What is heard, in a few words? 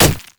monkey king basic attack; LoL